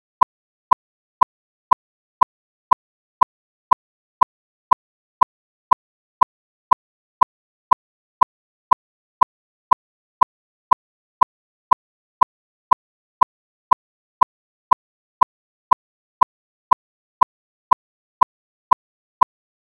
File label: beat test 120bpm; led-controller